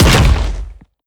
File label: step down, creatures strider; seq passby